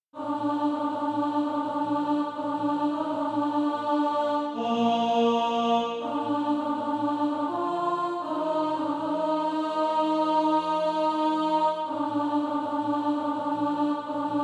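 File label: alto, Practise Music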